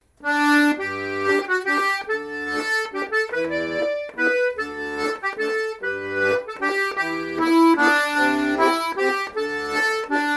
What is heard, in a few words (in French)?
valse ecossaise